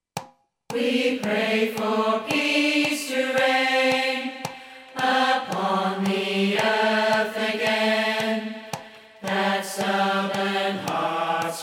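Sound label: Tenor chorus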